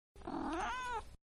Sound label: Cat-Meow; Cats; Animals; Manhattan Production Music